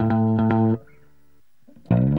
bass; Loops; Phonky

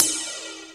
okay, Hats Electro Open

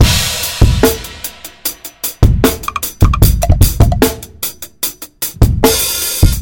almost a boom bap, Drum loops, Funky

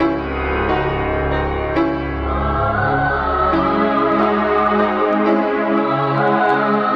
piano voice strings, Loops; Phonky

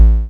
Kicks; Heartbeats

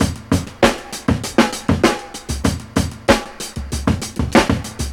Drum loops, Boombap

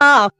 "ah", Voice

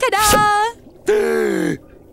tadaa gasp; Voice

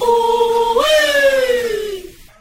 "ooh wee" group; ooh ahh

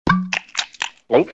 drinking

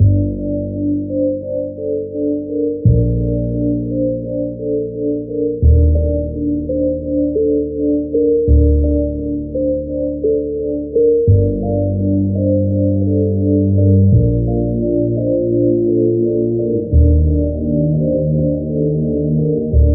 piano, Loops Sad

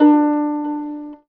vibrophane, Piano; Notes

